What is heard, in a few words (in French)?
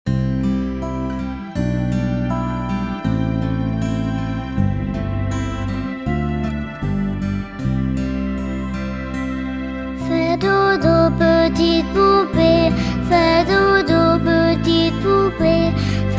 chant